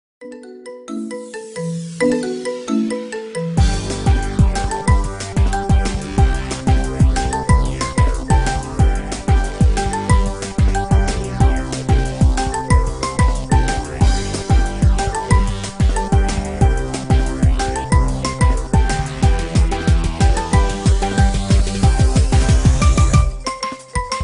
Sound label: marimba ringtone remix